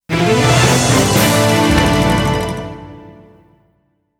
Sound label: Advancement Jingle; Vigilance; Pachi-Slot Sengoku Musou